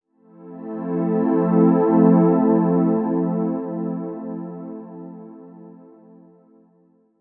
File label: DEEPHOUSE PAD 125 A; Keys